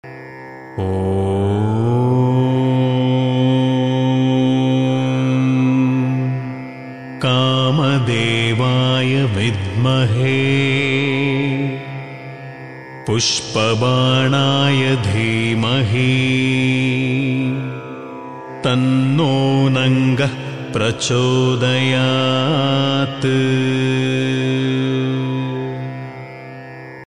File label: kama gayatri